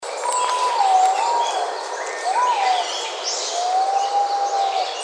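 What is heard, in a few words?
aobato, white-bellied green pigeon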